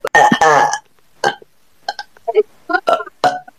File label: russian kid burps